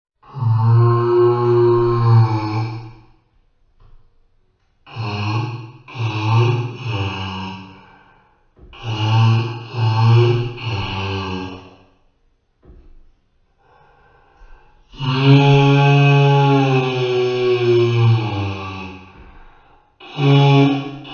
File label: slowed reverb ehh ehh eh